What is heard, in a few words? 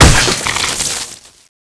hit